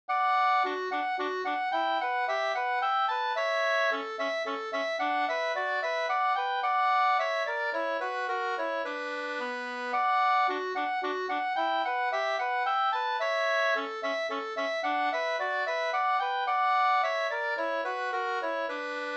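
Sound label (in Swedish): slangpolska, two-part; Sexdrega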